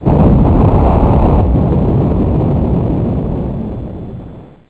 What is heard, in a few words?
Fire Echo; M4 7.5.2; M4™ (PowerMac)